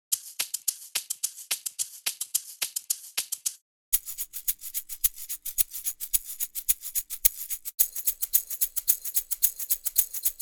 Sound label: amapiano shaker